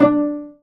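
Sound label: STRING PIZZICATO A2, STRING SECTION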